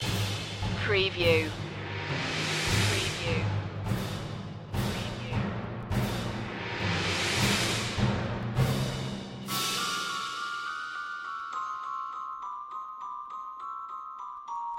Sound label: fight for survival percussion only